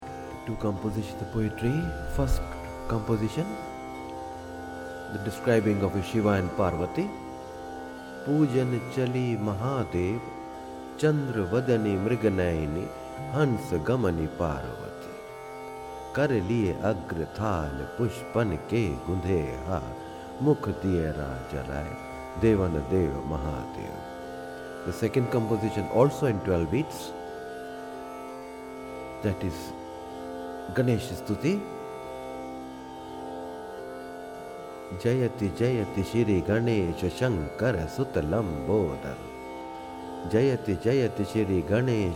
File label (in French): Chant Dhrupad, Raga Malkauns (Récitation Des Ve, male vocal; Récitation Des Versets